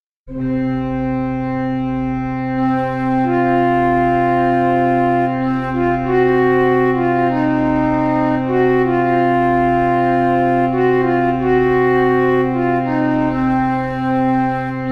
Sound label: rainbow drone